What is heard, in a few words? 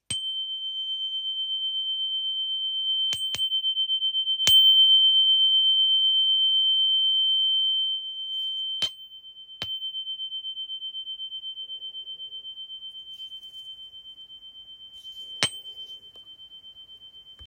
Chime; cakra 1 koren